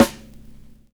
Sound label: VINYL SD, Drums Dance & Groove; USB Soundscan vol.11; AKAI; VINYL KIT